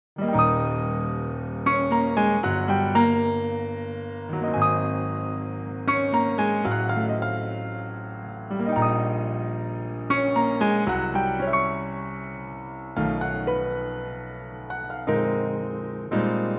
Eb piano; key - Eb; Pianotrak; Piano only arrangement

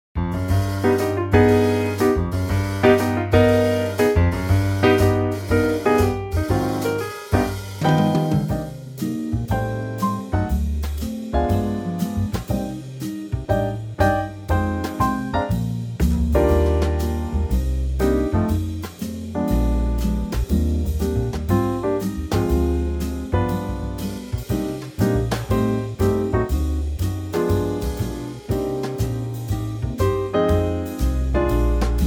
Cm Quartet; key - Cm - vocal range - G to Bb; Superb Quartet arrangement of this jazz standard. The instrumentation used is piano, rhythm acoustic guit

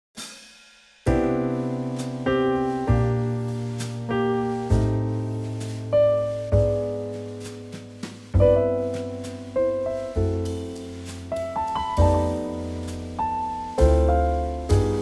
C Trio

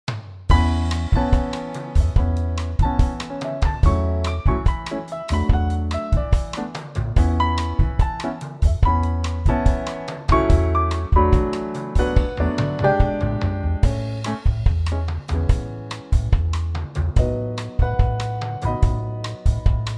A latin

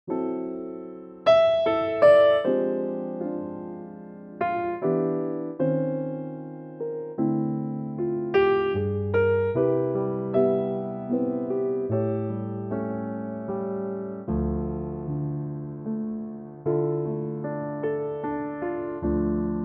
C piano; key - C

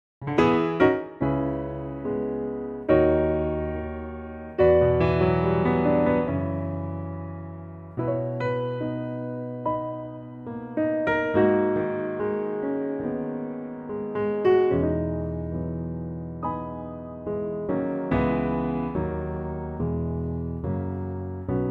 piano; Pianotrak; key B - vocal range - C# to D; Very lovely piano only arrangement; without the end key change section.

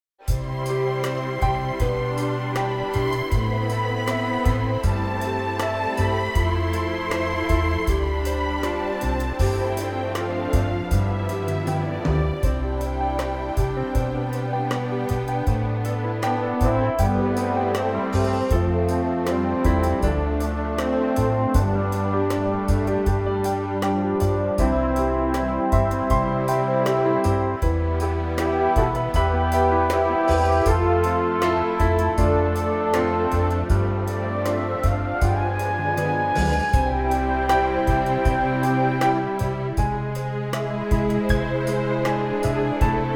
orchestral C; key - C - vocal range - C to E

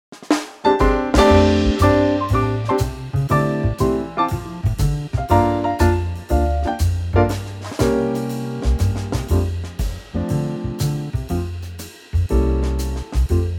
swing F G

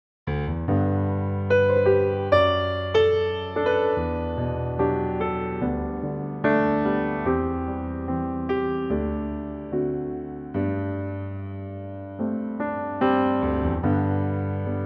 G piano; Pianotrak; key - G - vocal range - E to D; Beautiful piano only arrangement

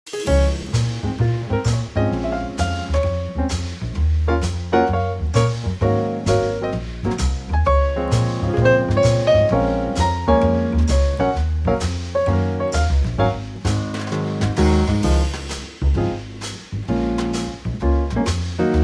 Db Trio; key - Db - vocal range - C to Db; A lightly swinging Trio arrangement

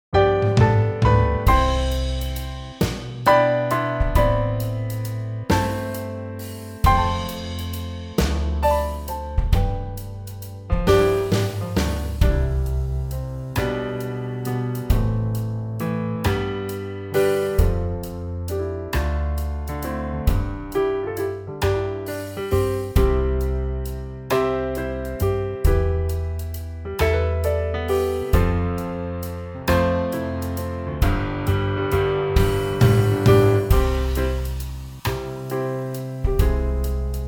Trio C; key - C - vocal range - C to E

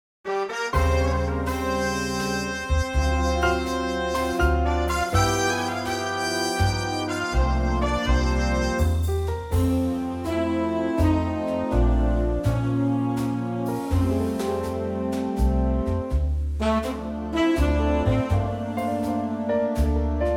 F Big Band; vocal range - F to A

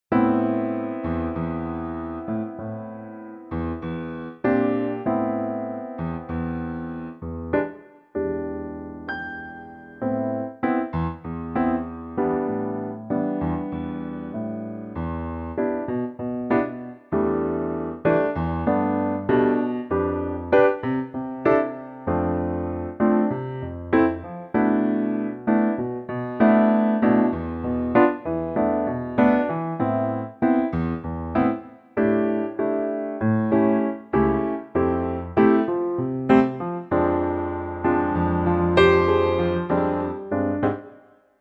Am Piano; key - Am - vocal range - E to A

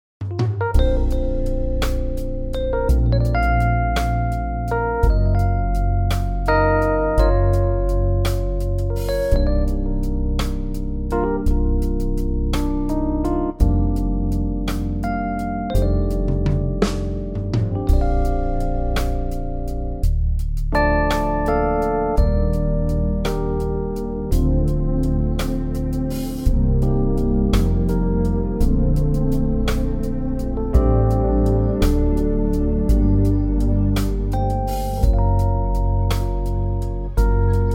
smooth Bb; 12/8

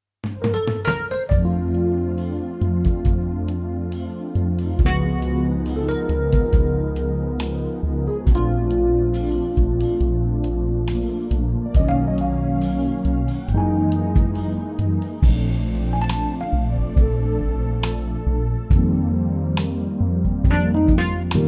smooth Bb

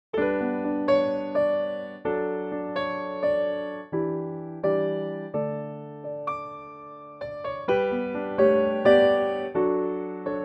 D to Eb piano; Pianotrak; key - D to Eb - range - A to F#

